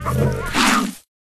Wild Flower

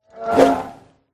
haosa attack voice bottom

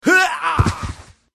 mage male death

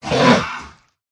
krofdor riding wound

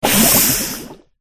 murena attack voice mid

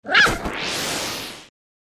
Dogfish Die; Pet

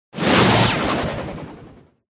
forest spirit

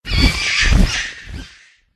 bat attack voice

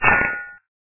block weapon

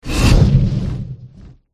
dragonkin

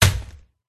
zarlog mag attack contact bottom

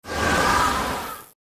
Air Spirit Die

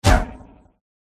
raven attack contact up

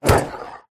dog block

